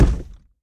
reel Bounce; jumanji

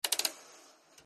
dice turbo

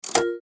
play pressed

